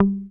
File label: HOFNER MUTE, Bass